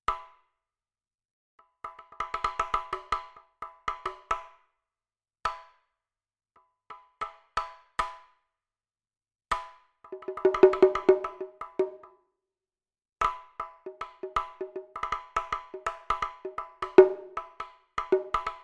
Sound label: taiko Shimedaiko Side stick; fxpansion; Shimedaiko2